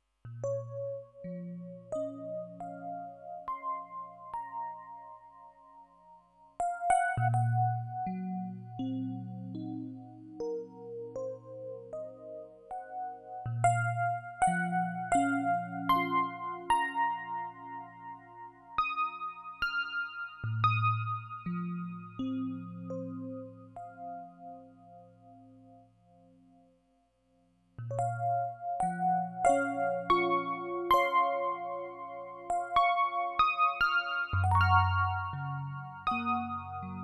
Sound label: Epiano